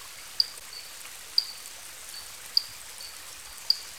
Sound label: mantella, frogs